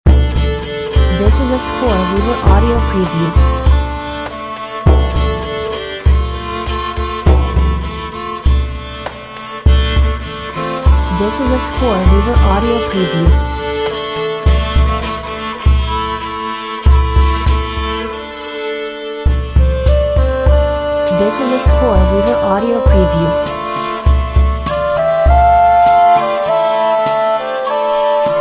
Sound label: medieval tavern; scoreweaver